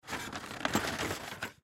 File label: Box Lid Open Pack, Box Lid Open Package; Foley; Blastwave